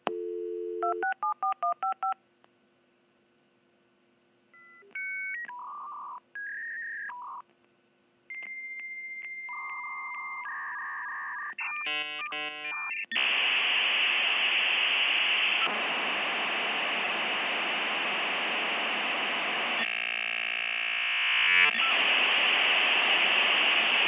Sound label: dial up modem